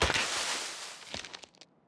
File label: tree leaves; factorio 2.0.55